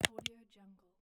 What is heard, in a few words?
gamepad controller stick push; stormwaveaudio